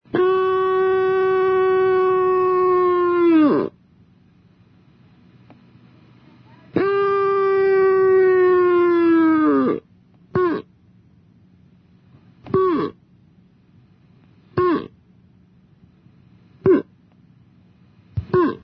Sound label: moose